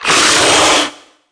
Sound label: nehebkau hit; DAoC; Toa; Gamestar